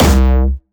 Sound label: C2, Jumpstyle Kick; vengeance essential club sounds-3; VEC3 Bassdrums